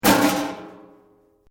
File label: METAL BOX; Metal (Sound Effects:Materials)